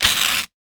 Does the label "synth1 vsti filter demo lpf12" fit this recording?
No